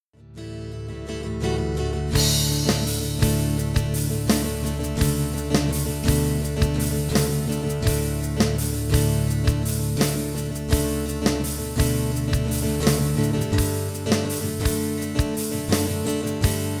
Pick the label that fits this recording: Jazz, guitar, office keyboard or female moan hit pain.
guitar